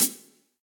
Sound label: Hihat Closed Cold Tight Room; Drum Hits; Session Drums Studio; MPE Hits